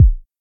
fluffy kick sound c sharp key, Loudest frequency: 85Hz